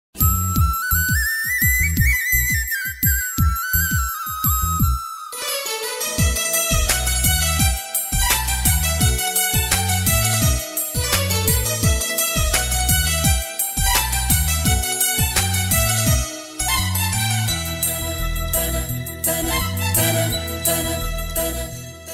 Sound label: flute bgm ringtone; meeshamadhavan